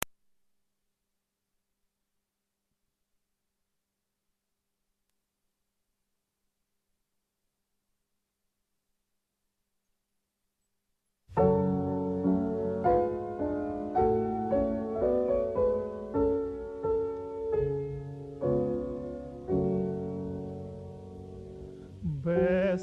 Ukrainian tenor Ukrainian song

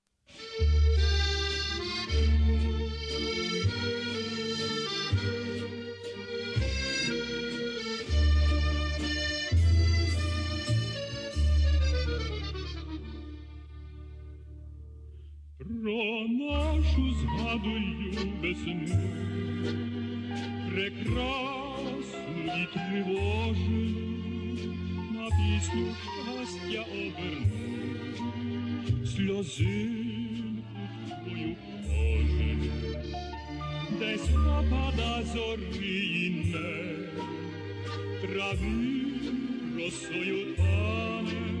Ukrainian tango; music by Bohdan Vesolovskyi; words by Volodymyr Sosura

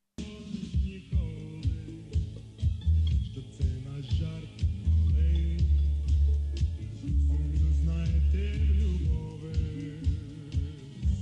Ukrainian tango

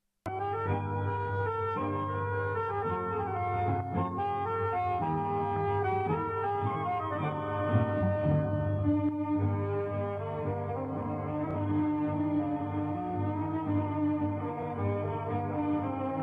Ukrainian tango

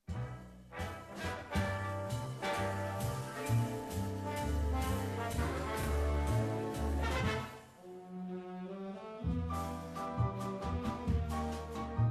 Ukrainian tango; Horchynskyi

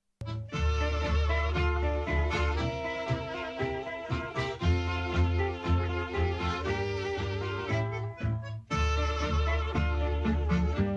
tango

